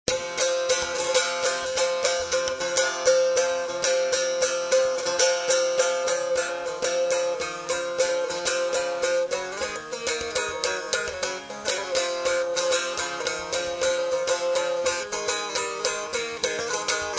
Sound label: Dutar